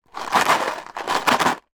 industrial plastic box of small screws movement

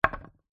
foley small stone set down on wood

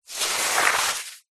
wet sand hand dig in; foley; zapsplat

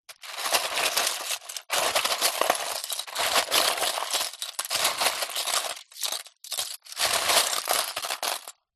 foley shells in plastic container movement; zapsplat

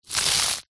nature ice thin crispy movement crush

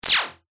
science fiction retro laser beam

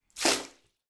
human spit bath water from mouth

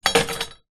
metal pole small lean against wall; foley

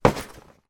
foley bag holdal polyester foot kick; zapsplat

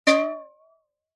cartoon metal impact wobble

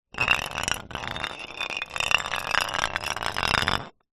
food drink beer bottle full roll concrete ground; zapsplat

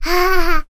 human baby toddler male 15 months laugh; sound effects one

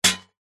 sword strike metal could be shield; zapsplat

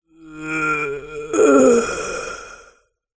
human breath last dying

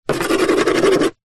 foley wood scratches with metal object; zapsplat

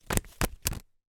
leisure playing cards deck drop release surface

